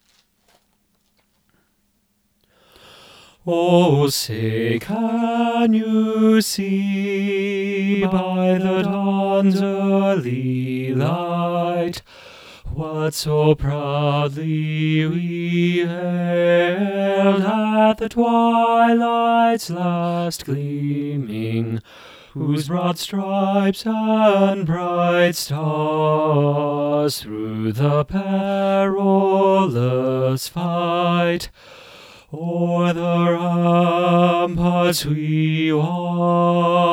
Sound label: ovation ssb bass 1 low, choir